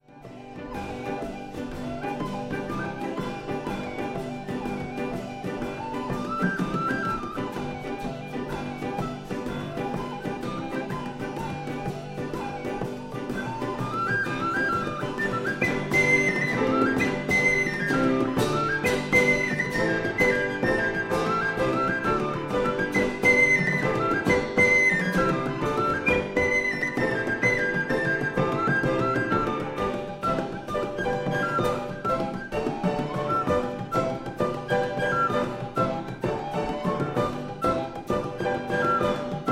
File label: Quartet